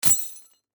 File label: Chain Set Down, Industry, Chain, Set, Down, Tow, Truck, Cable; Blast Wave